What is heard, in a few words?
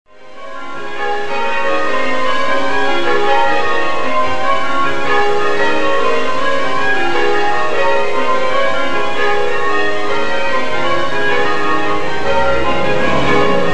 stedman; carbis bay